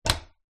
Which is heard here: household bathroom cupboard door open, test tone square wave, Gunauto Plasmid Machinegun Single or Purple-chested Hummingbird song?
household bathroom cupboard door open